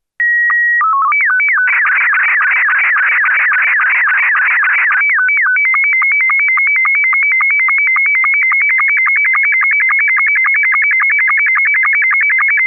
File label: sstv image scottie2; essexham